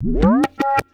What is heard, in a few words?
tape fx, scratch; Ambient Grooves